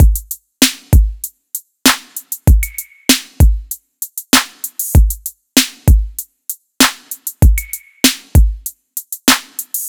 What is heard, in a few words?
97 drum loop full; mixready late oh eight